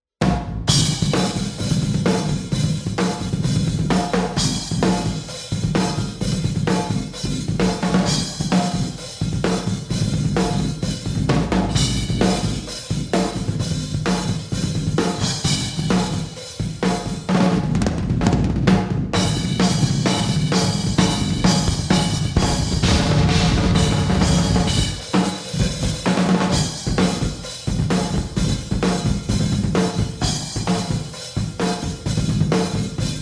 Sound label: drums; xmen